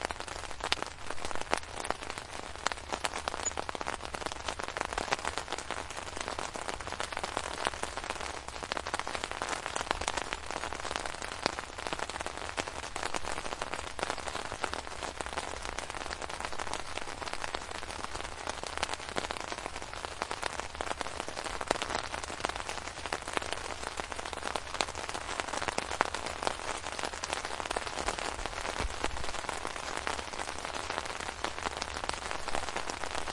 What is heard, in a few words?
rain on tent